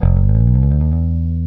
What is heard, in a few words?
E.BASS